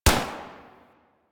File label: Fire Shot, Weapons; Warframe; Tenno AR